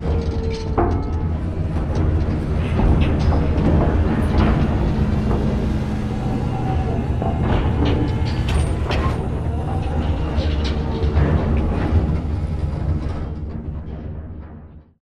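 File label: dog whistle idle mech medium distance; boneyard; Titanfall 2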